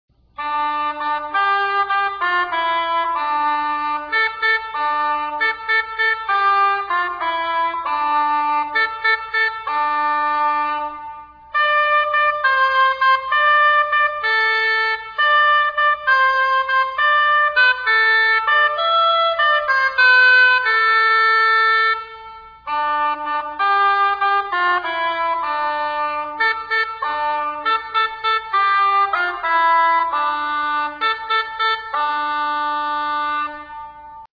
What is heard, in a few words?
Shawm Solo